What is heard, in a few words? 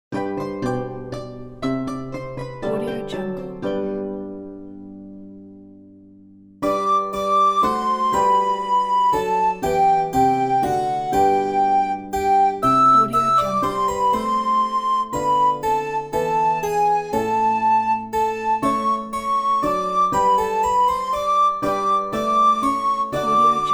medieval song